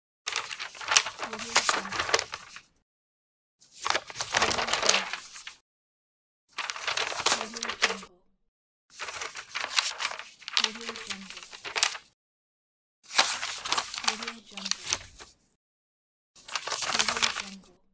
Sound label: papers